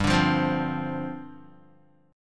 G 7th Suspended 4th G down Guitar

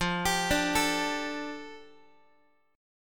F 6th Add 9th F Guitar; arpeggiated; chord; x,13,10,12,13,x; JGuitar

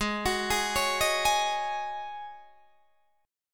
G sharp 7th Suspended 4th G sharp, Guitar DADGAD

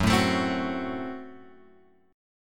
F sharp Diminished; F#dim Chord {2 0 X 2 1 2} strummed

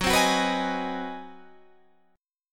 G sharp 7th Sharp 9th

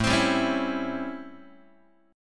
A 7th Sharp 9th A, Guitar; A7#9; strummed; JGuitar

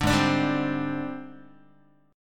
Ab Major 7th Flat 5th Ab, down, Guitar; strummed; JGuitar

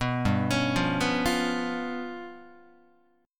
G 7th Flat 5th G down Guitar; arpeggiated; JGuitar

